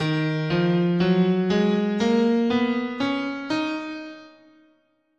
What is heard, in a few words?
Eb Aeolian, scale